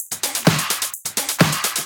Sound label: House loops 128BPM; Vengeance Samples